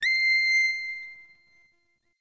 Hip Hop Pack

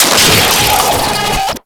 Auto Turret Close; Venus; Turrets

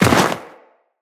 Walk Snow, Footsteps; Tenno

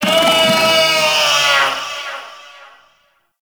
Feral Vocal Spawn A; Warframe; Catbrow

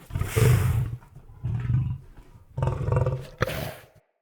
Wolf Idle Trapped Groan; Animals; Vocals

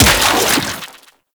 Rifle Charged Fire; Warframe; Infested; Quanta

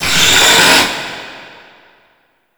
Breathe Out; Warframe; Stalker

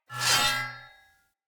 Melee Whoosh Accent; Weapons; Swords; Dendrit Short Sword; Accents; Vetch

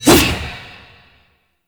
Void Tonfa Whoosh, melee weapons; Warframe; Zariman Tonfa; Void Mode

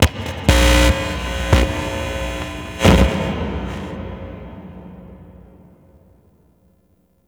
Stage Amp; Warframe; Ambience; Nineteen Ninety Nine; Obj Temple Defense

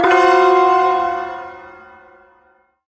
Shawzin Hirajoshi Chord; Hirajoshi Chord F; Warframe; Duviri Ersatz Shawzin; Chords

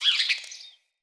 Fey Flock Attack Dive; Powers

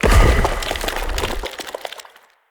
Turn To Stone Loop End; Powers; Fey

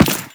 run default, footsteps; Warframe; Teshin

